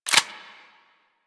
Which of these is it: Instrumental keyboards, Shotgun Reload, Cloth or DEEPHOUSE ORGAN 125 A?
Shotgun Reload